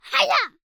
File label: Child Shout; SoundBits; Screams & Shouts 2 - Humans